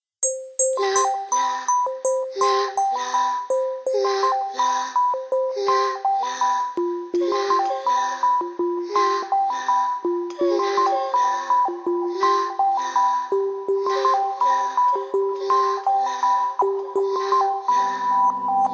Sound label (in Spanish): sonido celestial